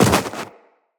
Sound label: Run Snow, Footsteps; Tenno